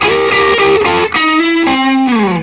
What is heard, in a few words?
SOLO, Vintage Blues Guitar